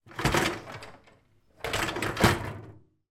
household dishwasher wire basket pull out then push back in